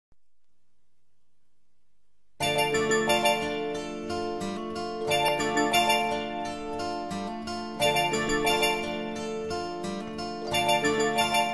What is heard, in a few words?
romantic song instrumental